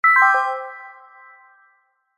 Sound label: multimedia notification digital bell warm ping